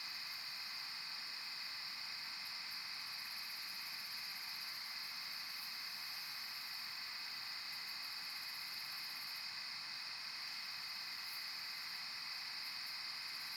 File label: Night Corn Field; Blastwave FX